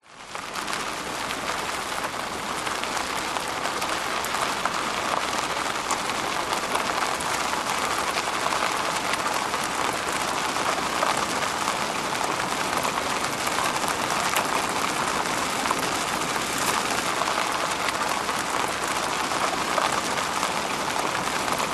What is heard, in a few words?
vehicles car hyundai trajet drive dirt road track external wheels stones pov; 2006